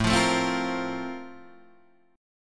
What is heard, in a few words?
A sharp Major 7th Suspended 2nd A